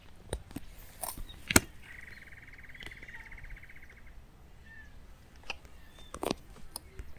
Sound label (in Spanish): chororo, Chororó (Taraba major)